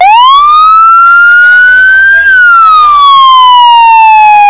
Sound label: Wail